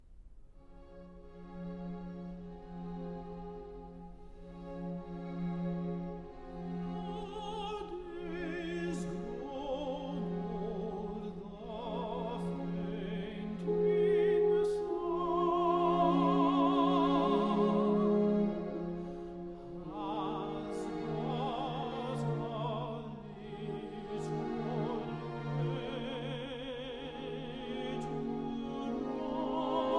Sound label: Serenade for Horn, Tenor & Strings, Pastoral